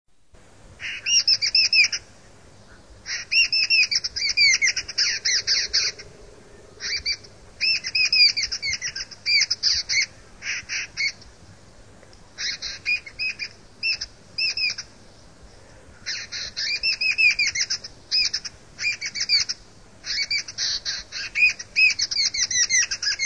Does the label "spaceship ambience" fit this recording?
No